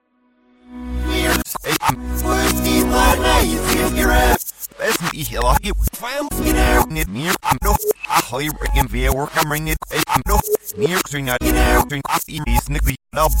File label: ice cream evil; reverse